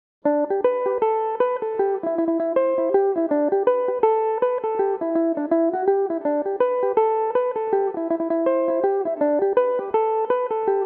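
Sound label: G major, Irish mandolin; Killavil Fancy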